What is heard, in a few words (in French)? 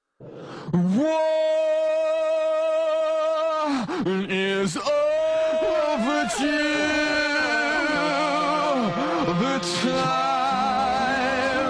Voix; Assassin